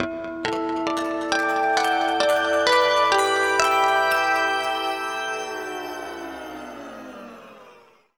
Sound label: harmonic, guitar; East West; Steve Stevens Guitar Samples Collection